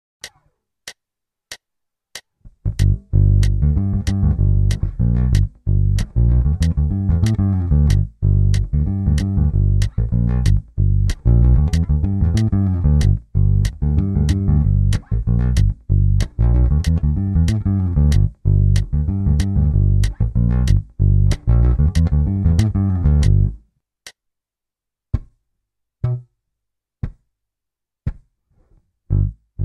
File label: G7 bass fill lick